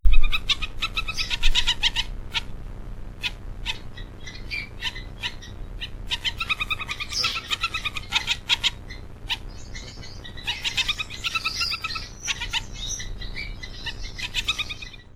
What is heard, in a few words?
owl finch song